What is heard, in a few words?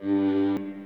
sea stop